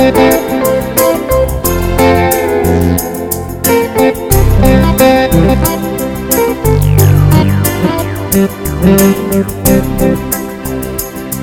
Slow and Beautiful

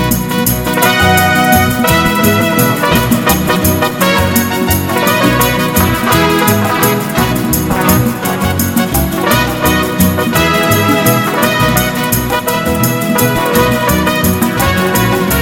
Hot Fast Mariachi

